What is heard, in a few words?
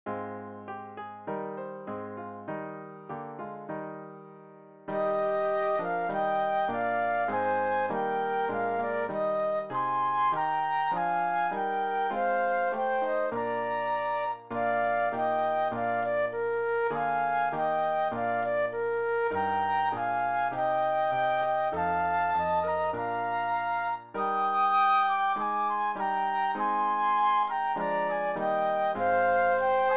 Hymn Flute Duet and Piano; classical; Haydn; Emperor